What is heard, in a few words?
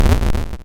hurt